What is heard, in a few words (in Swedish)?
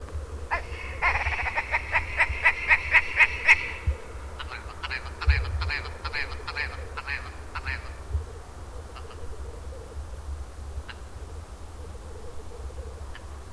Dalripa